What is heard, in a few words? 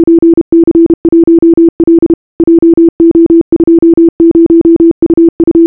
morse